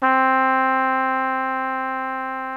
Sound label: BRASS 2 C3, ORCH BRASS; Greytsounds VOL-1